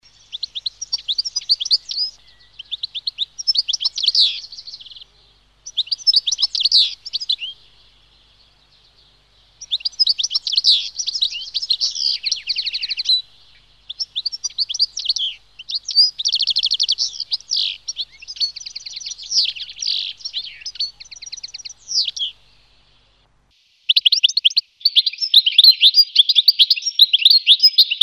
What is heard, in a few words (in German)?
Stieglitz, vogelstimmen; vogelstimmen-trainer